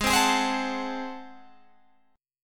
Ab 7th Flat 9th Guitar Open G, chord

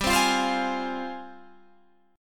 F Minor, chord; strummed; Fm/G

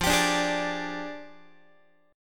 F Minor 6th Add 9th, Guitar; Fm6add9 strummed; 4A,4E,5A,5D,5G#,6C#; x,13,10,12,12,13; JGuitar

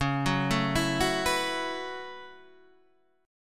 B 7th Suspended 2nd Suspended 4th, chord

